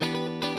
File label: Strum